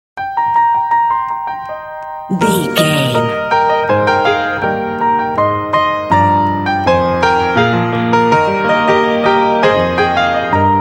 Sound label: piano